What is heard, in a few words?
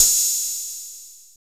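808 hard open hi-hat; Drums In Your FACE!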